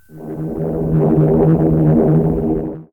airplane lg; TOAWDEMO

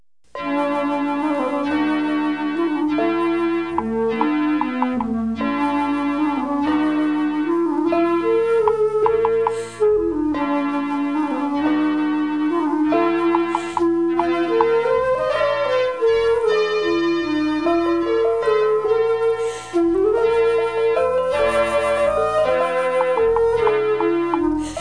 flute

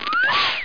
zap air

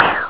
clap, synth

